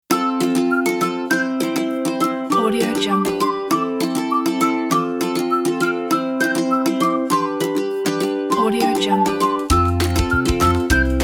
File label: cooking fun